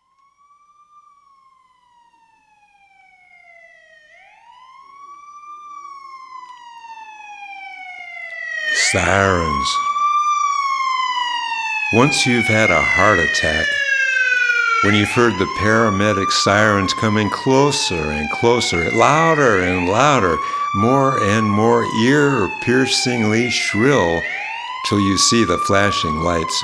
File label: Sirens